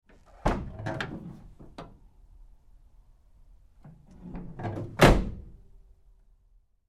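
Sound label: Truck GMC Savana Door 2nd Side Open Close Medium; Trucks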